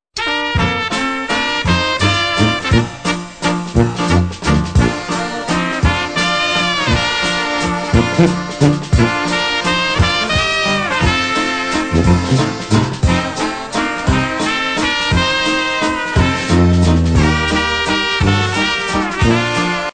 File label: Czech waltz